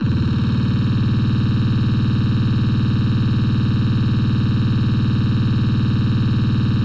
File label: Break 140 bpm